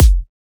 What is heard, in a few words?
Kick, Kicks; FLPRO